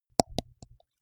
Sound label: teeth clench multiple; soundpool